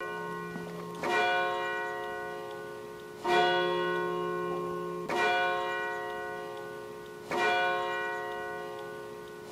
CLOCHE; GRUSSENHEIM 68; Cimetieres du Haut Rhin

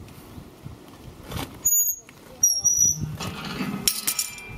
sortie, BRUITS DE PORTAILS; VOUILLE (79)

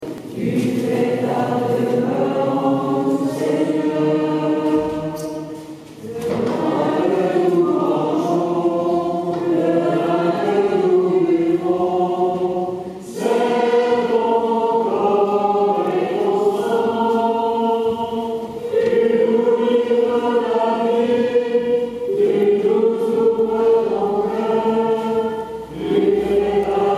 CHANT A LA VIERGE; ST ROMAN

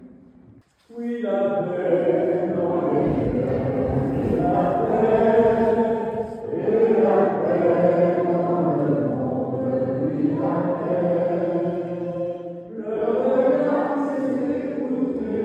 chant OUI LA PAIX; Assemblée Dominicale; Cimetières du Mellois

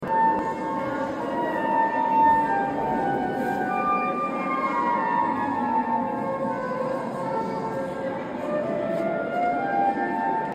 EGLISE NOTRE DAME FLUTE; CHEF BOUTONNE 79

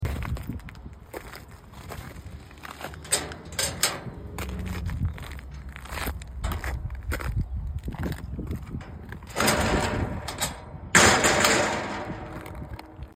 sortie, BRUITS DE PORTAILS; VILLIERS EN BOIS

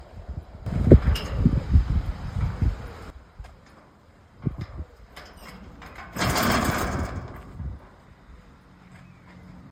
ENTREE, BRUITS DE PORTAILS; LA CHAPELLE BATON (86)

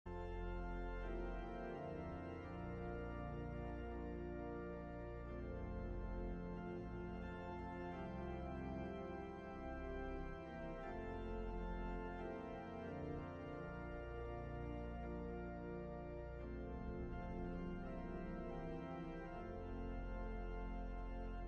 JINGLE; TITANIC

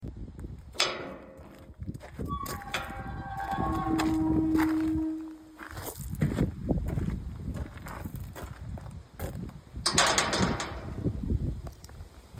sortie portail; LES FOSSES (79); BRUITS DE PORTAILS

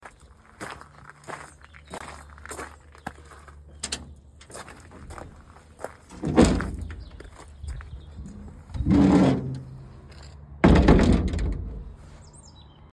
sortie portillon avant, bruits de portails; BRIOUX SUR BOUTONNE (79)

